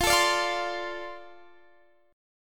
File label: F 6th F down Guitar DADGAD; F6 strummed